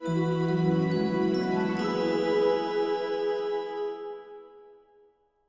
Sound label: Jingle Bells